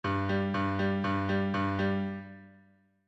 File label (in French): basse disco SOL Majeur G